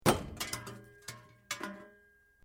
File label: Metal Light Movement Minor